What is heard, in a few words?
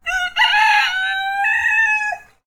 Rooster Crowing